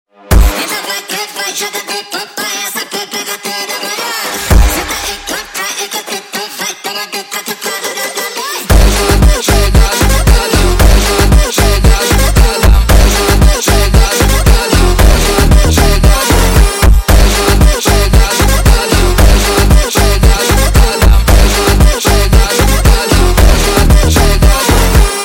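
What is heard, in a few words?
sped Up, phonk music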